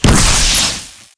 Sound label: electrical death zap; Titanfall 2